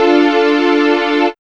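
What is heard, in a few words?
synth chord; X-Static Goldmine 1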